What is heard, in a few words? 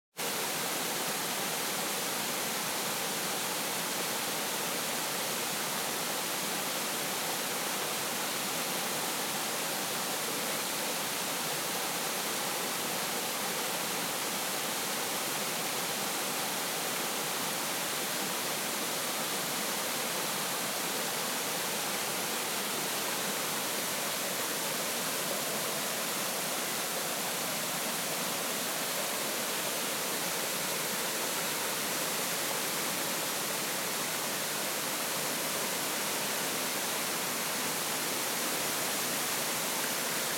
waterfall; stovo castle